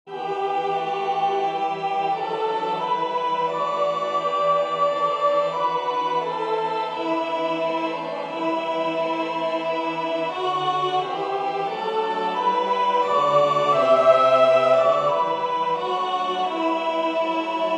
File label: Dormi Jesu soprano, synth; carols